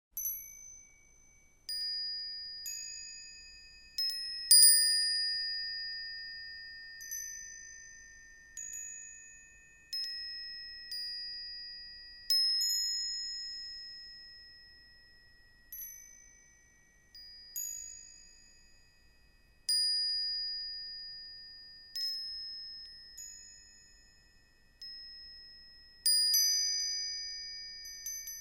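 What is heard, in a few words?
chime of sparks on the facets of a diamond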